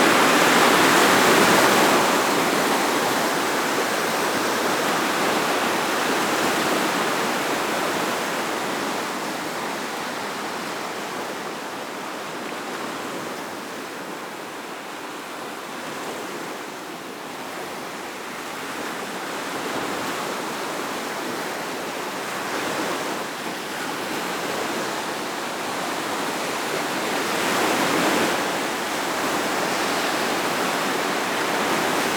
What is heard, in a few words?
Waves Ambience